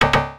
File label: wrong